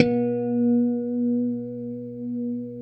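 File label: C4 TAP harmonic, guitar; Spectrasonics; Hans Zimmer Guitars VOL-1; JC-TAPONICS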